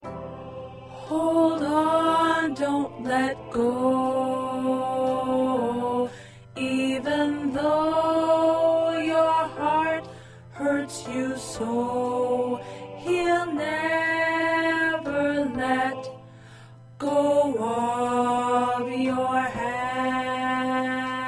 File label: Alto, choir parts